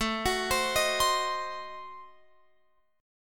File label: A Diminished 7th, Guitar Open F; x,16,18,19,18,19; arpeggiated